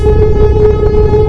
flyby; lmctf

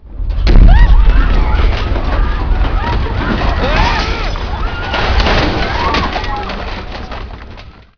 mist earthquake

